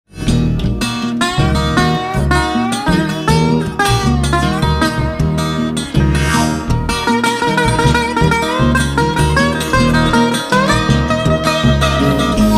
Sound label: blues